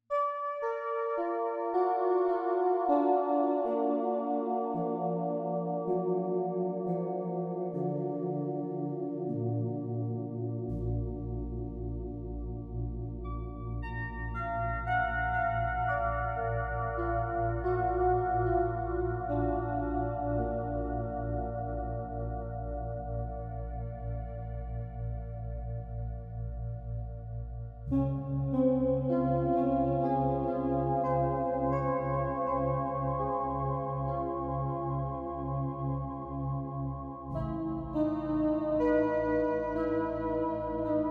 fm stack test

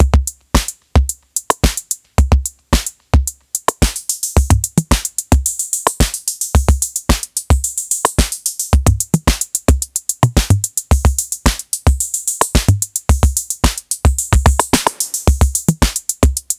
tanzbaer; mfb

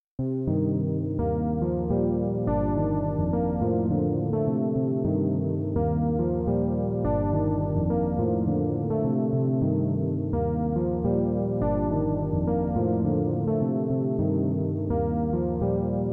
stereo waveshaping test

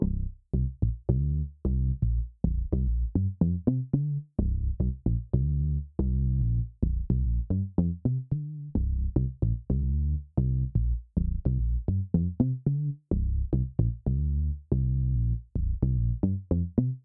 round bass

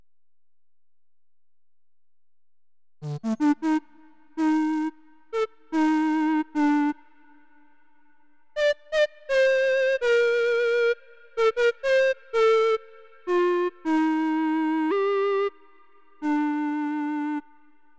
fm stack test

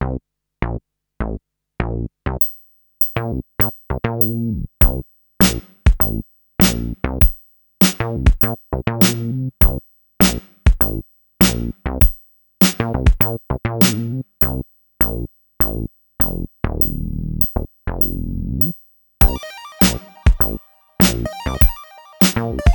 chipfunk

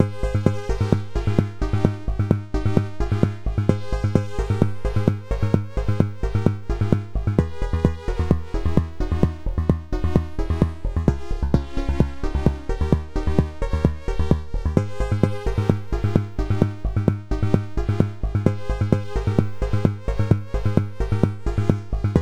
video game chipmusic test

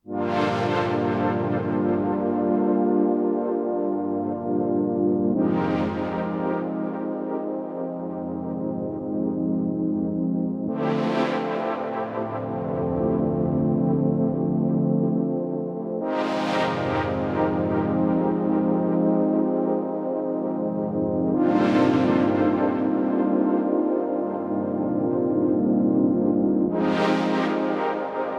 hpf pad

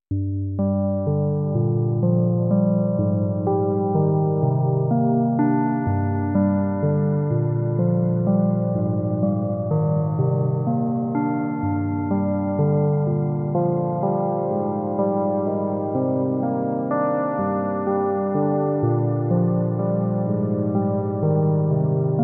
additive, synth

